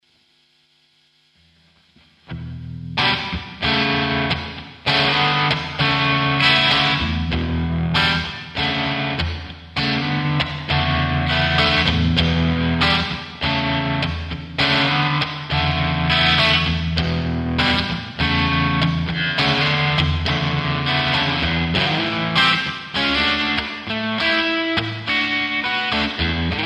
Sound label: dist, strat vintage; Bridge 1; Sounddemos Stratocaster Pickups